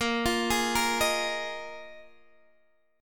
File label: A sharp 7th Suspended 4th, Guitar, DADGAD